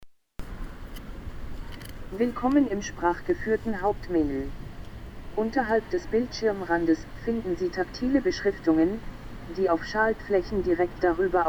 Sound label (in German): Paketabholstadion Sprachausgabe; Post SolarCity